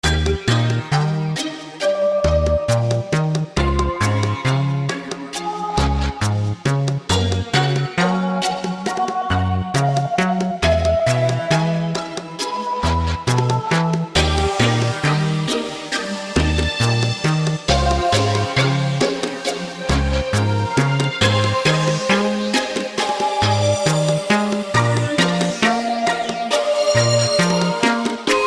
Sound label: Riverside Station Platform; paper mario the thousand year door; GameCube